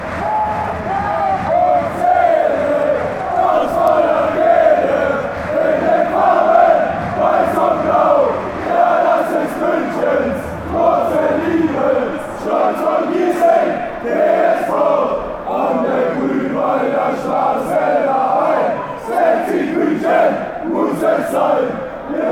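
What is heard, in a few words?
chant, football chants; 1860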